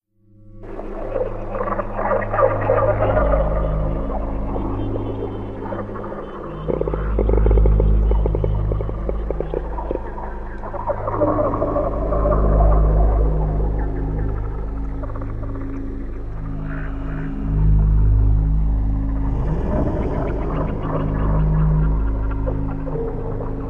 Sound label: Alien Jungle